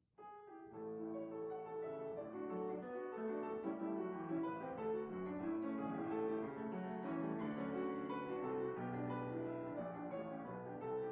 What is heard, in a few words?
Piano